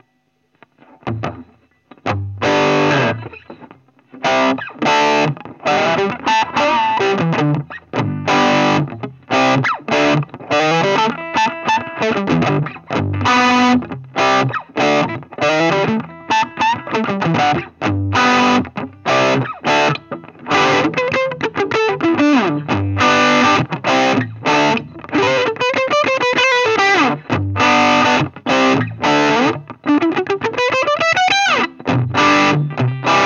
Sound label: con booster